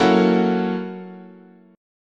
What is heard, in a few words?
Gb 7th Sharp 9th, chord, guitar